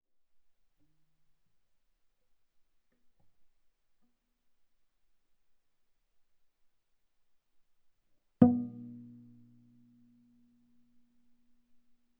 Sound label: Viola pizz sul G ff C5 G5 stereo